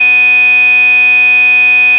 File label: additive voice single; FFTimbre